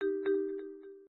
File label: Low Battery; Meitu; V7